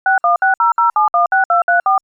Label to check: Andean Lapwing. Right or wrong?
Wrong